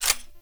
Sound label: Bow Latch; Grineer; Weapons